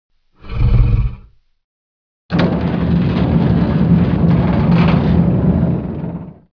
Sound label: animal roar